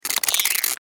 Insect Voice Anger, Insect, Voice, Anger, Wet, Clicks, Vocalization, Bug; Sound Effects:Animals:Insects; Blastwave